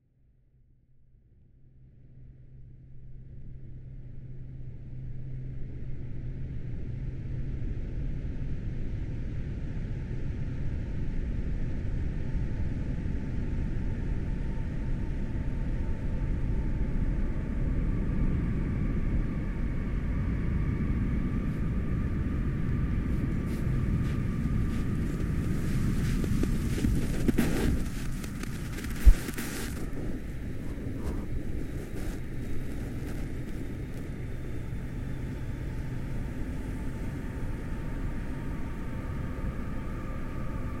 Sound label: Forming And Throwing Snowballs In Rain